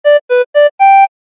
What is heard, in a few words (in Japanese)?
場面切り替え 笛風